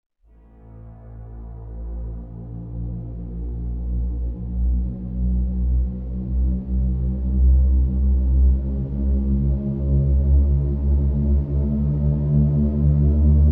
Almost A Minute Of Cold Tention Very Long; RoosterFX

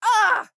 town human road hit; Blind Drive

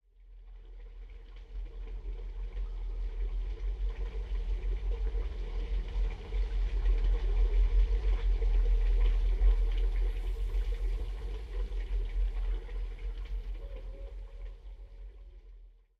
Pump Ambi; Pitch Black Audio Pong

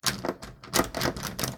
Door Locked; blindside

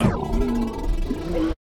punch left slow down really hard; Pitch Black - A Detective's Demise

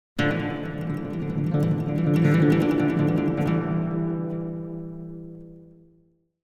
Acoustic Guitar - Guitars Long Legato; Hollywood Edge; sound designer's toolkit v2

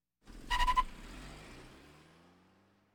Automobiles various

